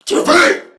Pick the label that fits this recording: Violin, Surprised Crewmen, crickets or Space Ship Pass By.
Surprised Crewmen